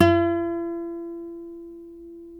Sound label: NYL NV F#, nylon no vib guitars; Spectrasonics; Hans Zimmer Guitars VOL-1